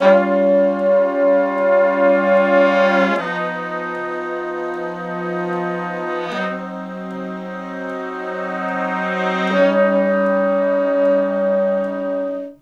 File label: Slo Blues Chords [V, IV, I, V] (Ab), Horncraft; Sections; R&B; Crimson Blue and Fabulous Horncraft 4 RnB